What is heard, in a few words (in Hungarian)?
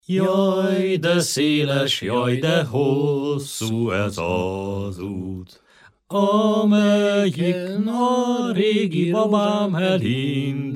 nepdal; jaj de széles